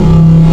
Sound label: ghost; Pokemon Solar Light & Lunar Dark 1.0.1